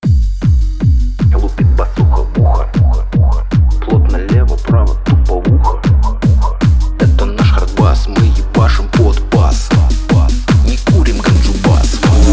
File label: RUSSIAN HARDBASS